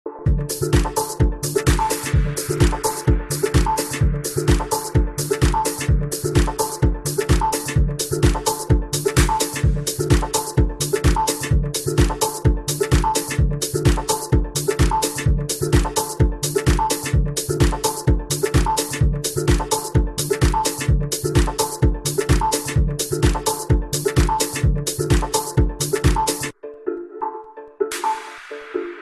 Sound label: Tech House